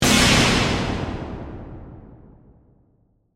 Hit Burst; Bright; Production Element, Imaging Element, Accent, Transition

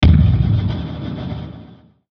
Hit Filter Warble Reverb; Blastwave

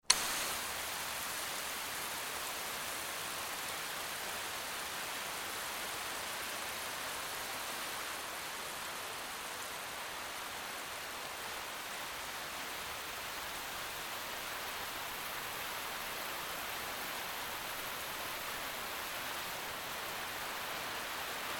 Water Spray Garden Hose Jet; Garden Hose Gun; Jet Setting; Watery; Spraying; Big Room Sound

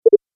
Activate Button; Button Sound Effects

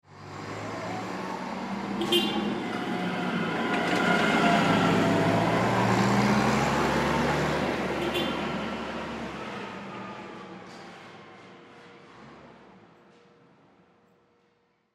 Forklift Pass By Honk Horn; Small Horn Honks; Machinery; INDUSTRY; Blastwave

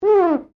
GLASS SQUEAK FINGER

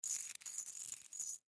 Nuts Bolts Hand; Hardware; Machines; INDUSTRY; Blastwave

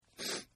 Sniff; Sickness Sounds; Sniffling; Human; Partners In Rhyme

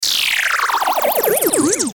Descend Burst Warble; Descending Sound Effects; Blastwave

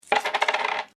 Coin Single Dropped Spin Wooden Surface; Common coins; Money Sound Effects; Money (Sound Effects:Objects)

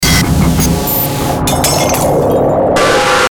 Stinger Glass Break Guitar; Production Element Imaging Element Accent Transition

